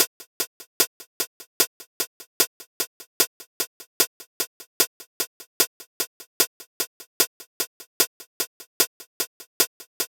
Nostalgia Drum Loop - 150 BPM Hihat; Cymatics - ANALOG EVOLUTION Beta

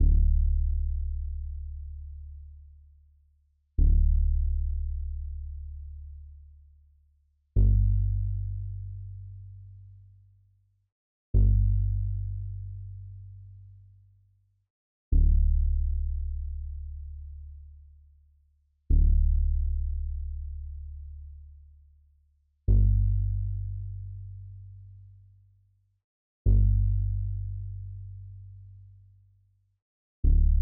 127 BPM D Min Bass, melody loop stem; Cymatics; Subbed; 2022 Melody Collection

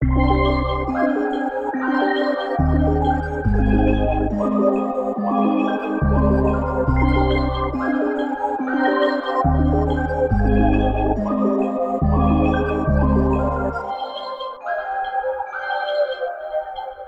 140 BPM C Min, Melodies; Cymatics; 2022 Melody Mystery Expansion

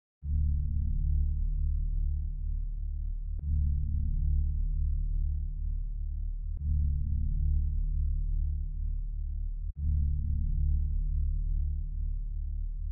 151 BPM D Min Bass; Loop Stems; Cymatics; 2022 Melody Collection Platinum Expansion; Mutant

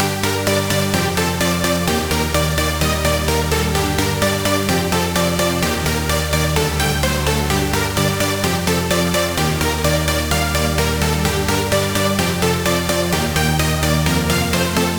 Super Saw Loop 128 BPM G min, EDM; Synths; Drop Loops; Cymatics; EDM Starter Pack

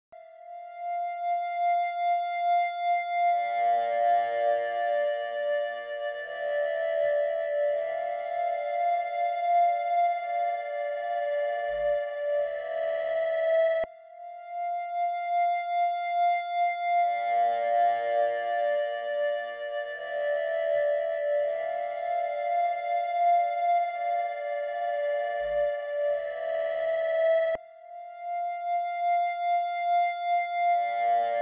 140 BPM D Min Pad, Synth; Melody Loops; Synth Collection; Octane; Cymatics; ANALOG EVOLUTION Beta

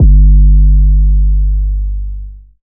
808 G; Drum One Shots; Cymatics; Light Free Sample Pack; Bonus Samples

